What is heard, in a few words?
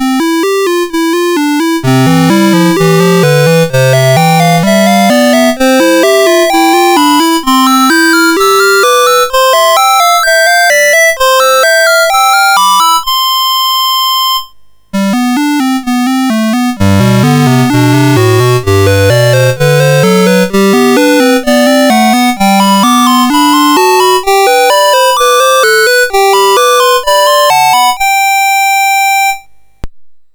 Two Part Contraption, MOS6581R4